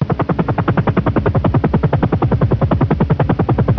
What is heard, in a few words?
chopper